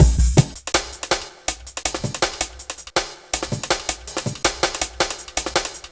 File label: 162 Dinky Break; m8 breaks